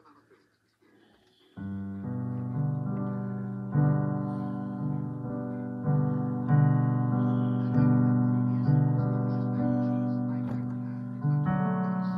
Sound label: Instrumental